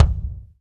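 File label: BD, kick drum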